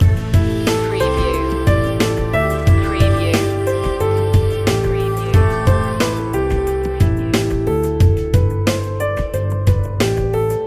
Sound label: concrete chill loop